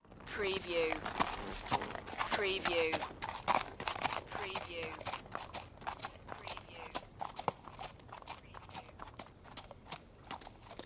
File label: Horse Gallop Away; Sound Effects Bible